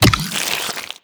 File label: bite pull, barnacle creatures; Half Life Alyx